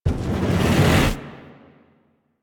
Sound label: Operator Focus Blast Fireball Charge; Warframe; Operators; Powers; Attack